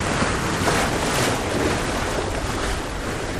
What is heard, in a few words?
Water Splash Off, bow wash